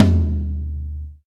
huge tom one shot d sharp key, Royality free tom drum sound tuned to the D# note.; tom drum samples